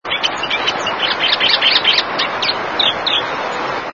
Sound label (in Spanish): voz corbatita dominó